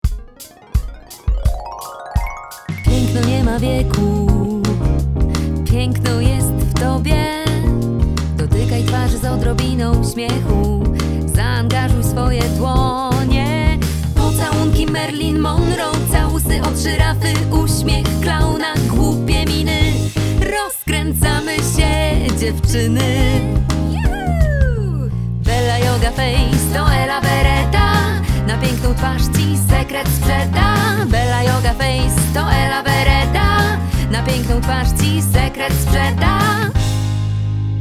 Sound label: vocal